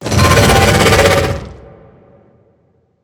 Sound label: worm roar alt, creatures; Factorio